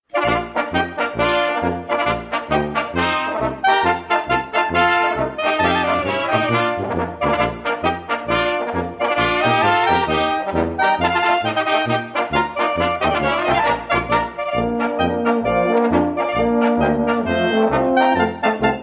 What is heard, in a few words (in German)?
Schottisch aus Neumarkt, Kapelle